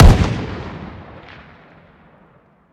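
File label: weapon lmg fire player atmo ext; gmod; viper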